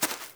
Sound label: footstep grass; allacrost-0.2.1